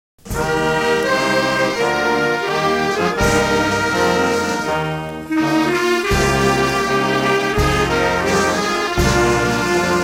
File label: National Anthems - MUSIC